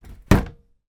industrial crate plastic drinks movement

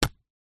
slingshot release

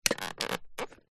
plastic thin press squash stress creak; zapsplat

